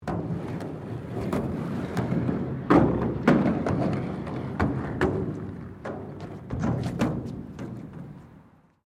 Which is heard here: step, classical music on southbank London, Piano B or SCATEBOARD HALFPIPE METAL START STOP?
SCATEBOARD HALFPIPE METAL START STOP